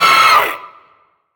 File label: Raptor alerted